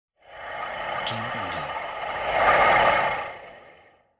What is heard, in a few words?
Scary Breath